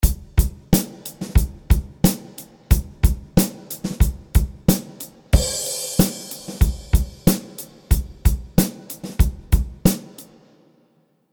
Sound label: Drums Wembley Arena; Découverte de… la Reverb (2)